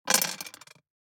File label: DICE on Glass, Throw and Roll, Standard, 4 Four Dice; Samples; ArticulatedSounds; DICE Sfx Party (Extended)